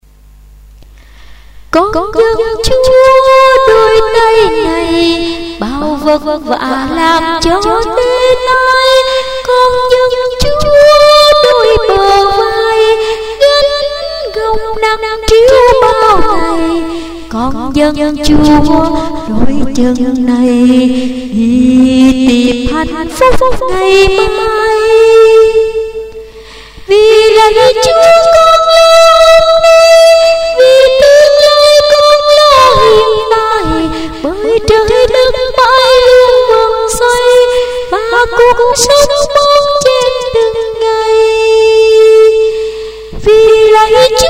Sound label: sop alto